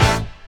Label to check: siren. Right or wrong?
Wrong